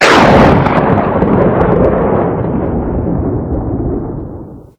thunder; crampman

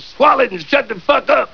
swallow; dod

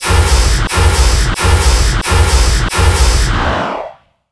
transport; darthmaps

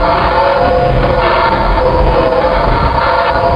scrape wall, tank

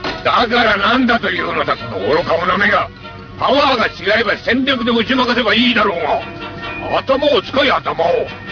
time up

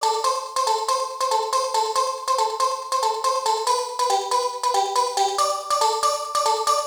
melo, party

